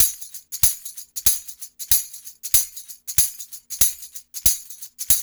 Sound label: TAMB; East West; Funky Ass Loops